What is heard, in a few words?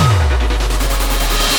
Burning-Hot Beats; Pac-Man World 2 Re-Pac